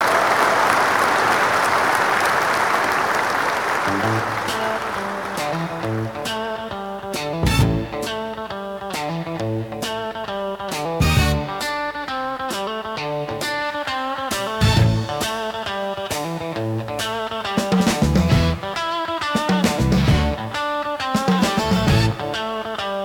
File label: 6 String Blues Applause